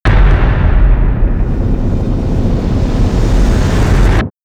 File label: Weapon Upgrade Music